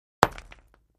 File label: Patio Block Hit Sledg, concrete & brick